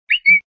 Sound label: Disneyland Entry Whistle